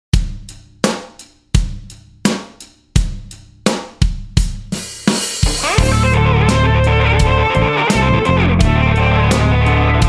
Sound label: slide mix, guitar